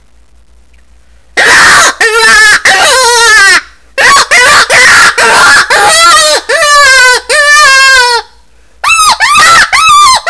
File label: Rasp, rabbits; Yote Smoker